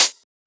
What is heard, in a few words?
Closed Hat; FLPRO